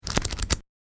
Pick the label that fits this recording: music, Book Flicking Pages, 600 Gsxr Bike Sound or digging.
Book Flicking Pages